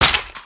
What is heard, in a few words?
wpn large, human step; Footsies